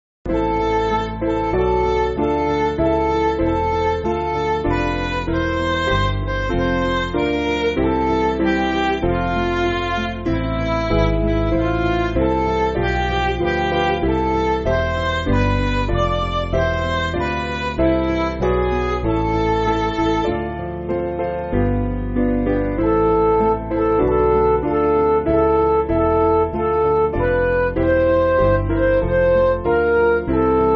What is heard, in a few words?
Midi